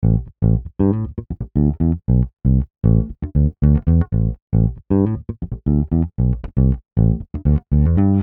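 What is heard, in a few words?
117 A minor Electric Bass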